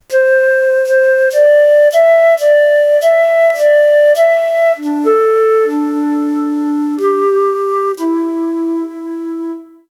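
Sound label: warm renaissance flute melody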